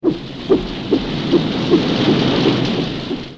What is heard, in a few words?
Huey destroyed descending; Airwolf